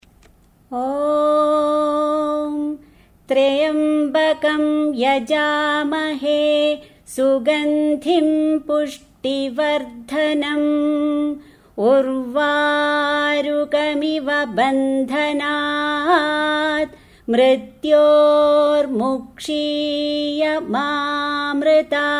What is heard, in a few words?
mantra mahamrtyunjaya; spiritual